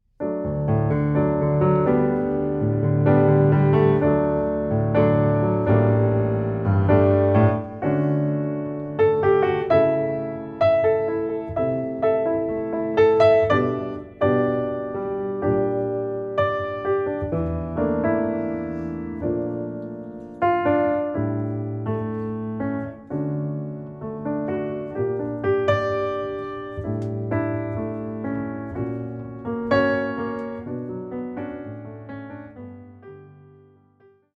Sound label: Innocent joy piano